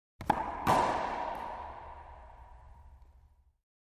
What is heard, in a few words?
Racquetball Serve; Hard Hit With Long Reverberant Ring Out